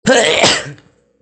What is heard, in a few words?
sneezing